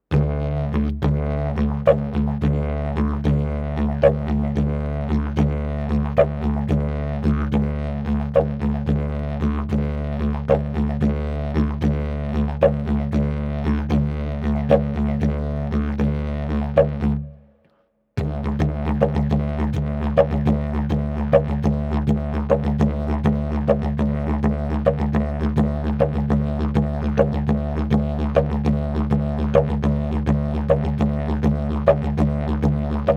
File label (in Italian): Khaliji